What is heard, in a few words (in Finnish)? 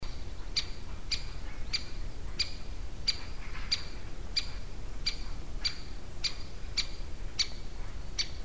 tammitikka; käpytikan kutsuääntä; Huhtikuu 2009, Imatra; Jarmo Pirhonen